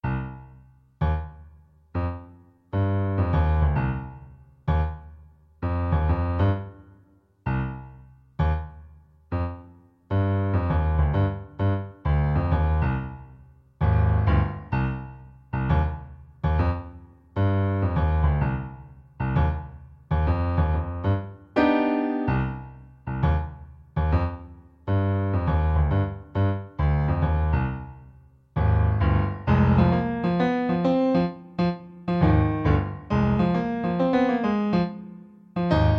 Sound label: piano music